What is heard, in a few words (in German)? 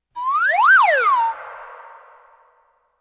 Instrumente Gleitpfeife Glissando Auf Und Abwaerts